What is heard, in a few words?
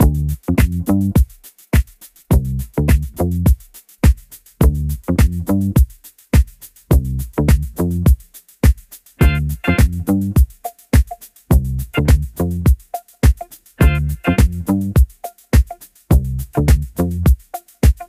groovy lounge groove; gummy musiclab